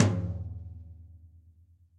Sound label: Tom Low Classic Muffle; Drums; Drum Hits; Ableton; Session Drums Studio; MPE